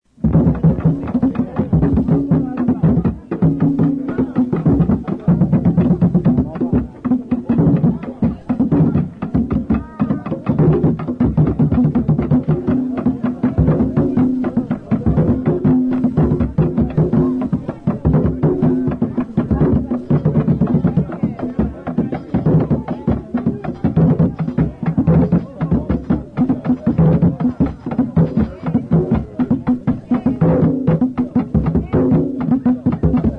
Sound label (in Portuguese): BATUQUE instrumentos